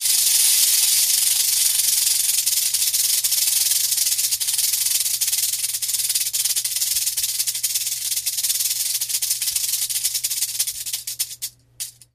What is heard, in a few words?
Rattlesnake Tail Rattle